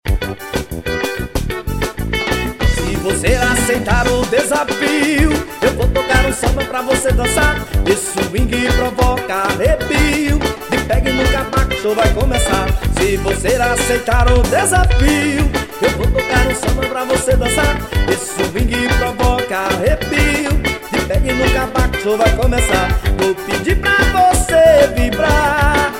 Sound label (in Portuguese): forró